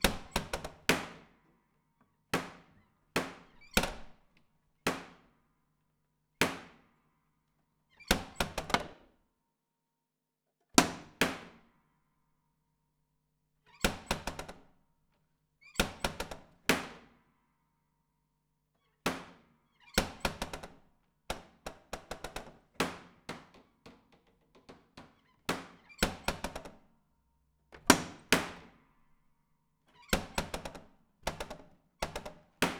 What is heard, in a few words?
Panels Slap Dense, Piano Destruction